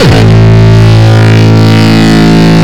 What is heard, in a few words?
Longkick, kicks